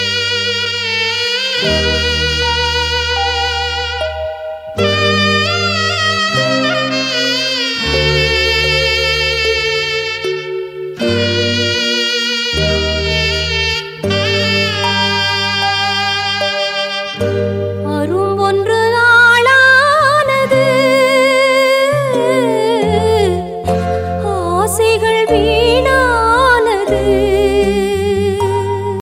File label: song tamil ringtone